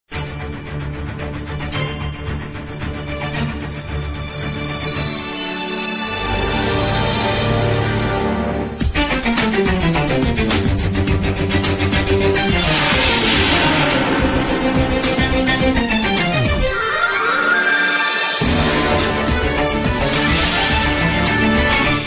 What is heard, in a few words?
micromax new tune